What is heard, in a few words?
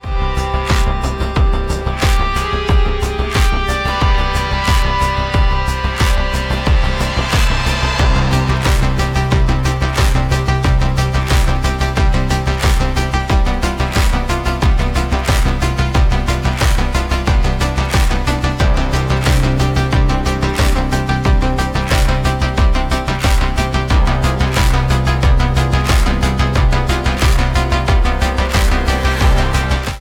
desolate pulse, Post-Apocalyptic Ambient Music